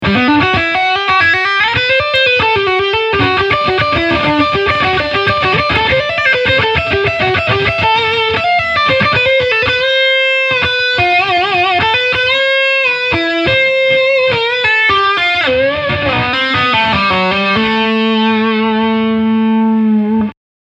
Lead UK USA Morph

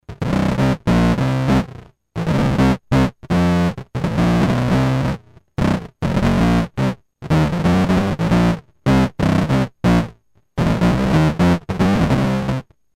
FreqBox ebass

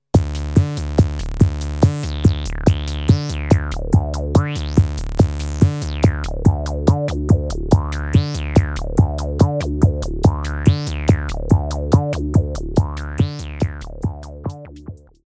disco

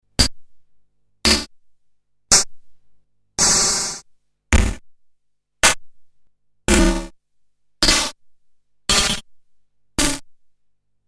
Korg DDD 5 Weird Mod

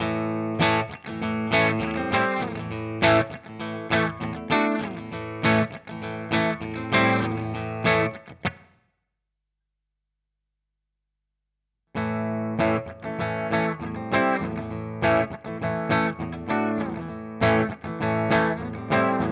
Harmony Guitars Silhouette Bridge Tone

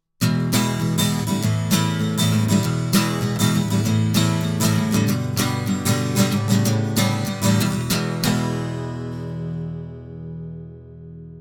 Acc Gtr vintage steel; Warm Audio

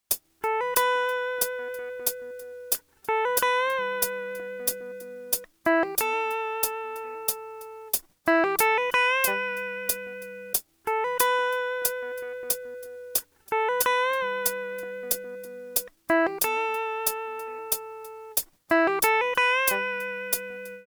Boss RC 202 git clean

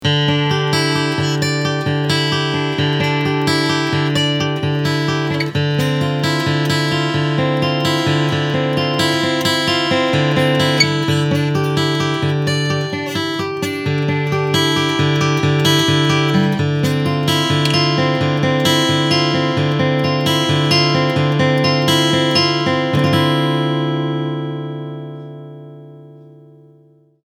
Picking

